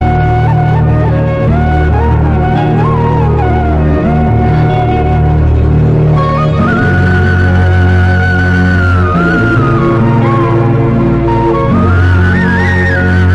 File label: Bgm; Murari Movie